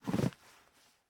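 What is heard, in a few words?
Server Battery Take; raw game audio files; MiSide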